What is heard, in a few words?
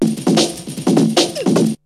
BREAKBEATS, TECHNO